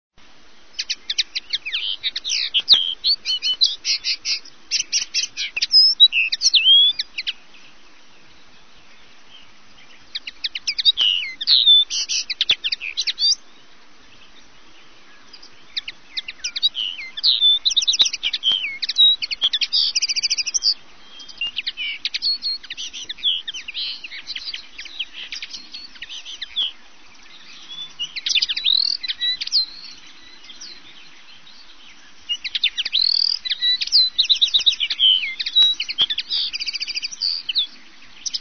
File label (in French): linotte melodieuse